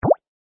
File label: button click